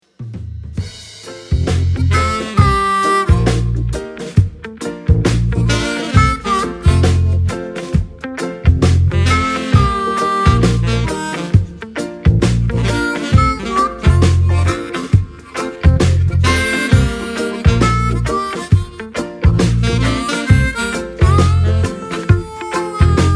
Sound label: reggae piano and organ